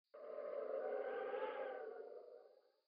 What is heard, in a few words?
wind fade out